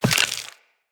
Walk Bone, Footsteps; Operator

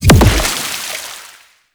Gouache Impact C; Warframe; Follie

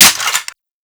Mech; Warframe; Weapons; Sicarus

